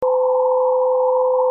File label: Bark tone